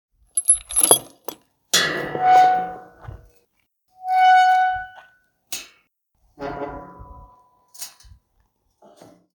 Metal prison gate opened with slight keys rattling